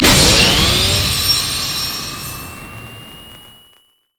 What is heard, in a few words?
power ball; robo rammus